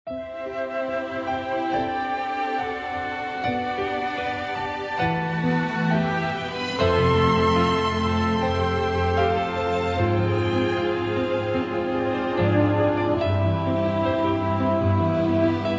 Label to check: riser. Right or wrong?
Wrong